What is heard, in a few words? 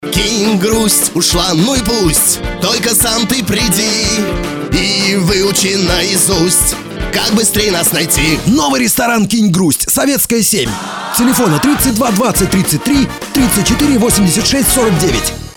Vokal